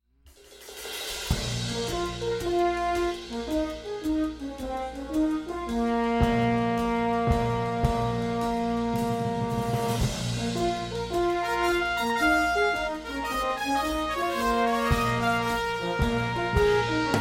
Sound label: Anthem of Praise instrumental accompaniment